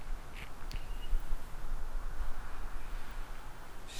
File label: whistle